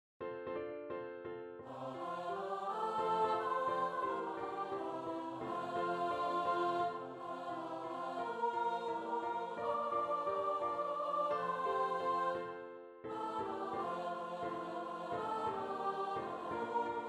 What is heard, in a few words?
Cowboy carol SOP